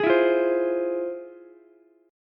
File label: F Minor Major 7th, Ukulele, chord; FmM7 strummed; JGuitar